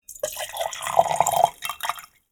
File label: Pour drink into a glass with ice